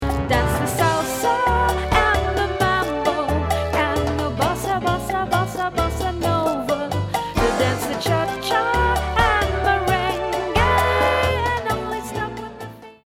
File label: fifths salsa